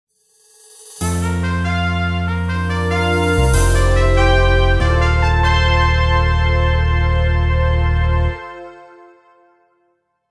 Course Intro Fanfare (Race); Mario Kart Double Dash

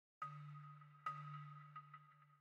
Balafon

